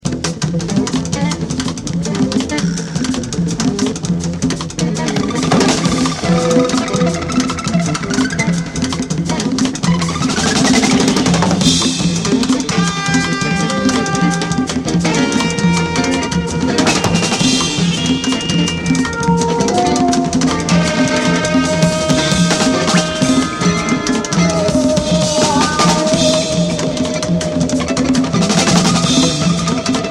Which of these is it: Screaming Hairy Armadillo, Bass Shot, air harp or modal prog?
air harp